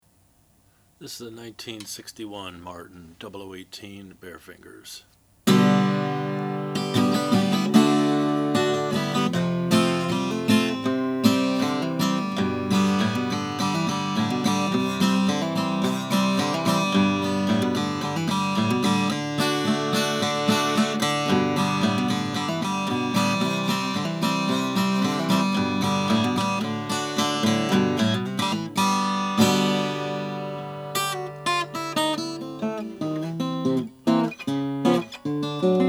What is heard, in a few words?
martin 00 18 natural